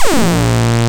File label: ARP Synths, SAW SYNC B A0; E-MU Formula 4000 Series Vol. 3; Analog Odyssey